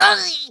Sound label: Male Rat Death